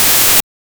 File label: alert